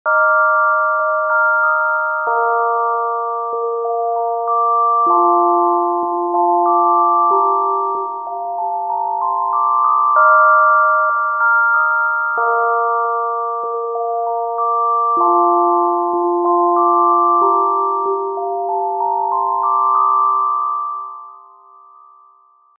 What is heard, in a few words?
jewellery box; leaf by music sound software